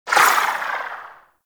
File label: Pistol Tail; Sapient